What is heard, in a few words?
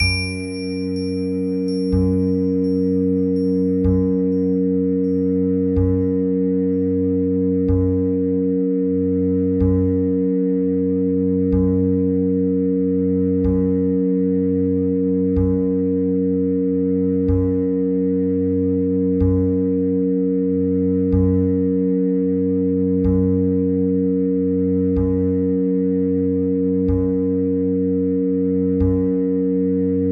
Zen Wave Triad in Gb; Mike Cirillo; Musical Self-Actualization; Mike Cirillo Method TMSA